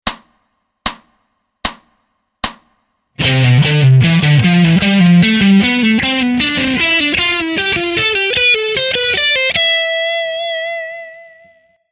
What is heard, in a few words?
3 Nts Per Stg Patt No4 (SLOW)